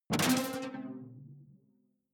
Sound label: sci-fi alien bounce detuned bright